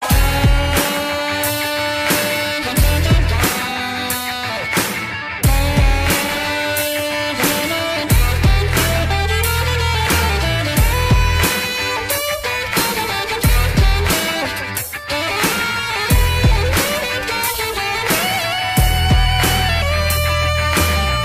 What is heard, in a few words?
sax solo